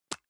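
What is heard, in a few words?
deodorant can lid open soft; haus-garten; badezimmer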